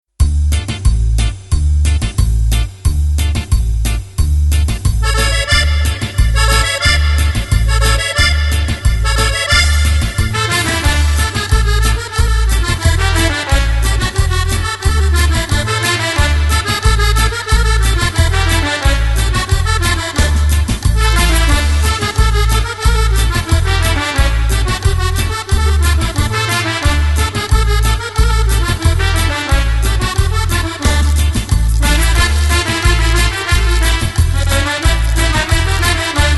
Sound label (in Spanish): cumbia